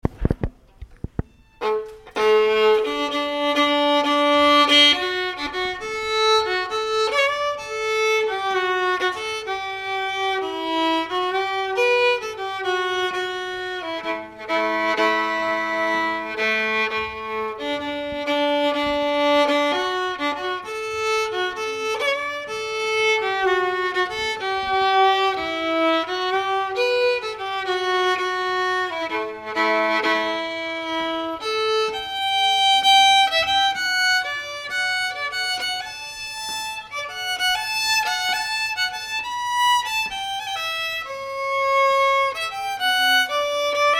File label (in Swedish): brudmarcsh; Malungs